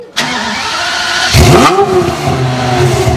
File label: Pagani Zonda F Start